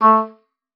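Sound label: oboe d'amore A3; Xsample VOL-7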